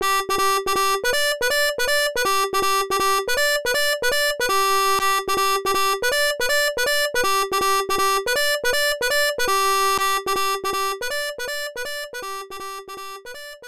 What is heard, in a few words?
New high score; Billy the Kid Returns; IBM PC AT